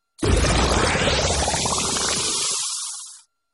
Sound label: Bubble